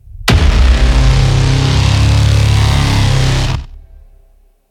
Hit; Monitor

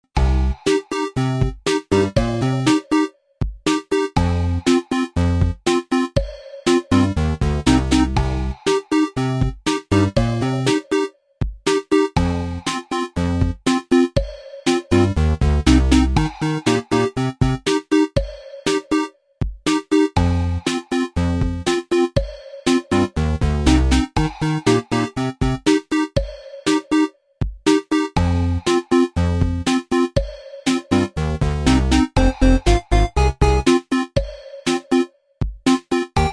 Ghost Mode Lobby Theme; Kahoot

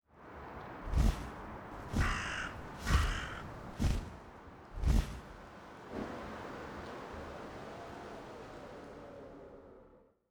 Bird Flying; Lucius III

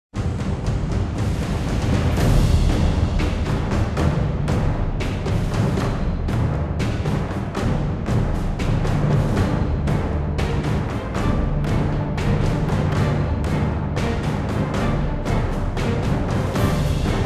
Barrier Fail; Mass Effect 2

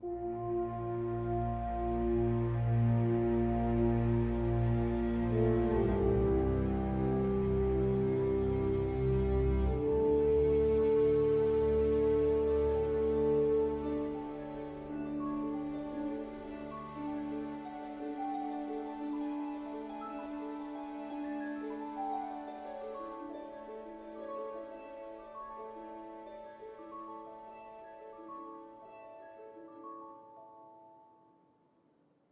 World Music

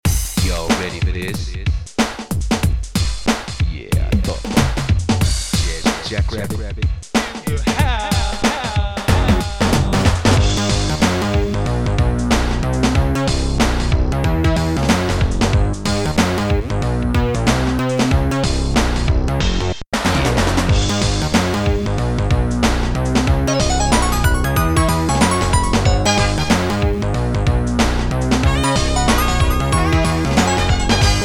Menu; Jazz Jackrabbit 2

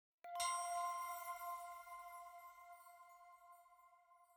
item Jingle Chime Only F

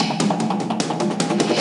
Desert Percussion

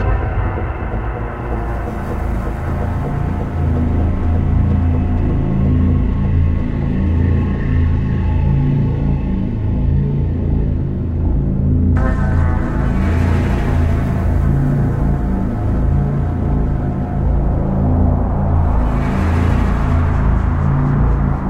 Air; Saints Row: The Third; Air Steelport 2

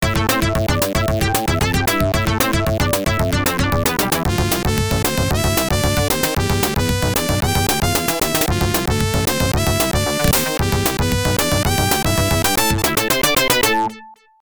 Round Clear; Fighting Hawk; arcade

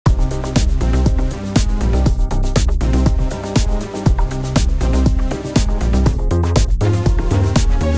Disco Ball Music

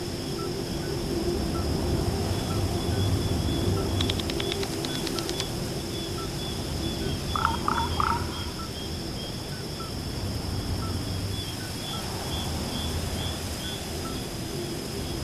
Ambient, The Lake

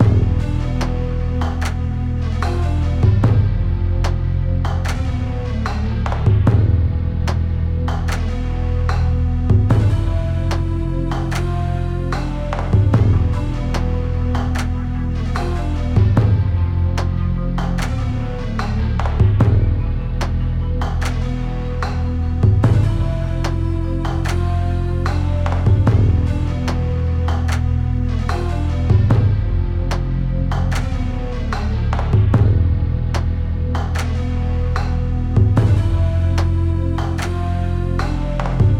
Pause Menu; Mortal Kombat Arcade Kollection; PS3; Windows; Xbox 360

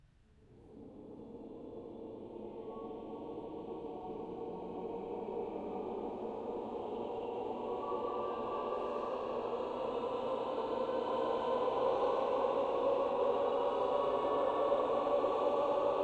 Choir; Outlast 2; PS4 Windows Xbox One

